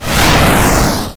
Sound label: flame burst; Lucius Demake